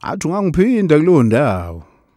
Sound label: Vocal Chirp, African Voices